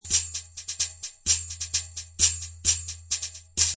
Shetland Shaker 130, Shetland Shaker Loop At 130Bpm; Percussion Loops; Shetland Shaker2 Loop 130Bpm